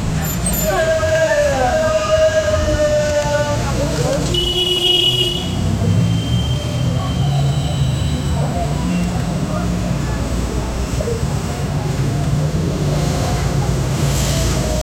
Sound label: realistic immersive sound, busy street